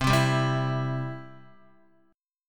C Major, Guitar; strummed; JGuitar

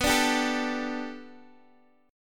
B Minor 7th Sharp 5th B Guitar Open D, chord

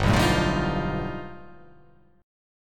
G Augmented 9th

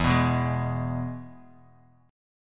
E-Major E, Bass Standard; strummed; JGuitar

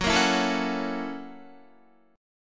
Gb 7th Sharp 9th Gb Guitar; strummed; Gb7#9; JGuitar

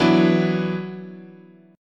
E 7th Suspended 2nd, chord

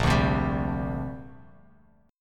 A sharp 6th Add 9th, Bass Standard; strummed; JGuitar

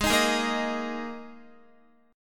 G sharp Suspended 2nd, Guitar; strummed; x,11,13,13,10,10; 4E,4A,5D,5G,5C,6F; G#sus2

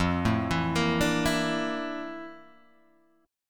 F Major 13th Guitar Drop D

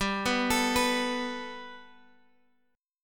G Major Add 9th Guitar Open F, chord; Gadd9; arpeggiated; 19,18,21,18,x,x

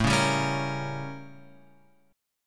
A 7th Flat 9th; A7b9 Chord {5 4 5 6 X 6} strummed

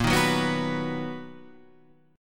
B Major A sharp; B/A#

